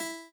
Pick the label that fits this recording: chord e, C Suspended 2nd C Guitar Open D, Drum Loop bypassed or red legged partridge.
chord e